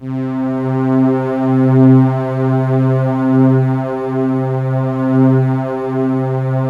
PAD C3; USB Soundscan vol.05 - Explosive Jungle; AKAI

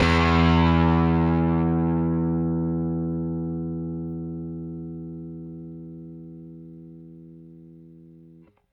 Rickenbacker Bass; Airwindows

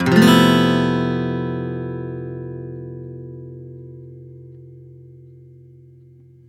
F# maj7 #11 downstroke, Acoustic Guitar; Sonic Foundry (Sony Creative Software); Songwriter Acoustic Guitar Companion; One Shot Chords

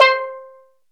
steel drum, Percussion; SN-R8-01 Contemporary

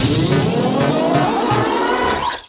Kaos FX, Ambient Grooves

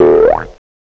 BOINK; CMI Sound Library Rev 1.3

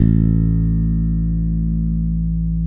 BASS A1, Electric Basses; E-MU Producer Series Vol. 8 – Platinum Phatt

